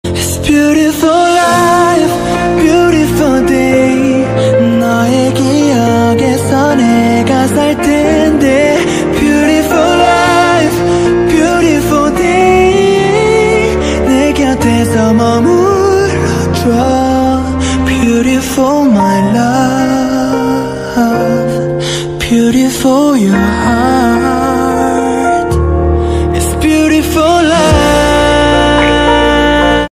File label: Beautiful korean ringtone